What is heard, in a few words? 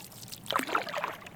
Canoe Paddle Light Paddling In Canoe; Electa Quinney